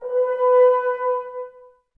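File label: Brass French Horns B; Sample CDs; Miroslav Vitous; Woodwind Brass Ensemble; F.Horns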